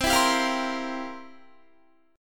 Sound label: C 11th C down Guitar; strummed